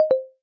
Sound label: voice failure; Samsung Galaxy S10